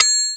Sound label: BELL, GM drum set; METOR BELLD; AKAI S-Series CD-ROM Sound Library VOL-8